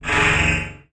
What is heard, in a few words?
Transition Metallic Distortion; Production Element